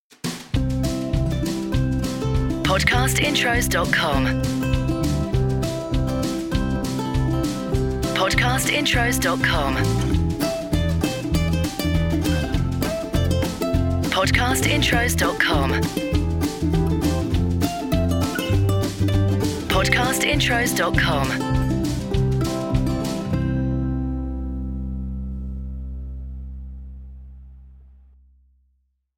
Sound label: FUN Happy Tune; Podcast Watermark